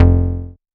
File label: Bass, Synths, 5U Modular